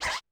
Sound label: Korg 01W Dance Kit; 300 Drum Machines